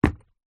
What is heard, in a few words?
foley footstep single shoe business leather buckle zipper womans on wood floor